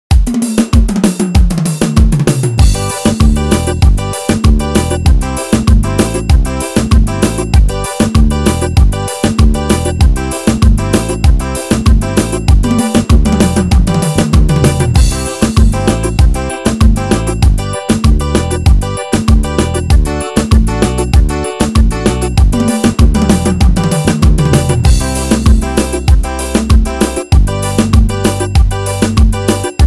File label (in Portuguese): forro rasta chinela, Ritmos Yamaha